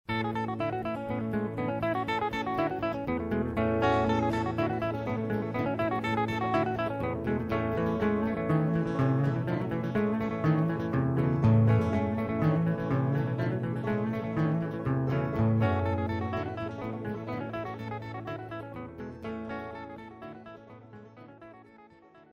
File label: guitar pickers mix